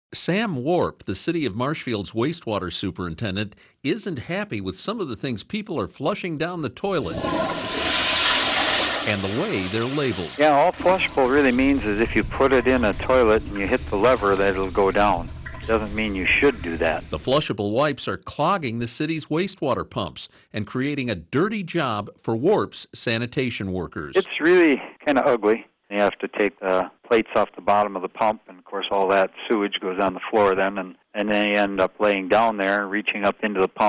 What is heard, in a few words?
FLUSH